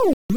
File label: ate fruit; Mrs PacMan